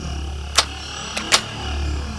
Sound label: ak47 buff reload